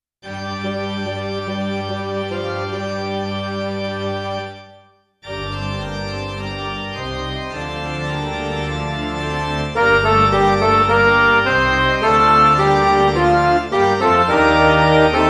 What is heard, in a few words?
midi